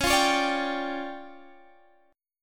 D Minor Major 7th Double Flat 5th, Db

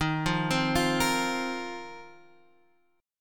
F 7th Suspended 4th Eb

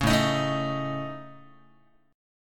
A Major Flat 5th, Guitar, AMb5 chord; strummed; JGuitar

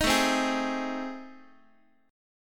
A Minor Major 7th Flat 5th, Guitar; strummed; AmM7b5; x,x,6,7,4,7; JGuitar

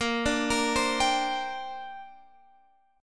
D 7th Suspended 4th Sharp 5th, chord

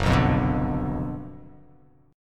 F sharp Major 7th Suspended 4th Sharp 5th F sharp

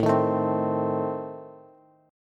A Diminished A down Bass; Adim strummed; JGuitar

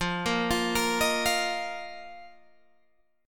A sharp Suspended 4th F, guitar chord

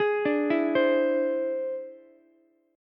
E Augmented 7th E down Ukulele; E+7 arpeggiated; JGuitar

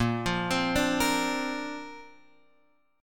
Bb Major Add 9th, Guitar, Open F; arpeggiated; x,5,5,7,5,5

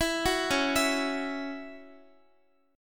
Db Minor Double Flat 5th Db Guitar

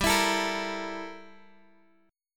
G Minor 6th Add 9th G down Guitar Open D

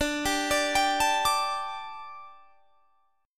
D Suspended 4th D; arpeggiated

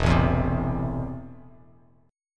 E Suspended 4th Sharp 5th, down, Bass Standard; strummed; JGuitar

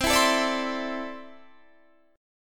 C 6th Add 9th C down Guitar DADGAD

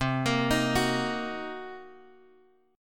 C Minor 7th Flat 5th Guitar Open D, chord

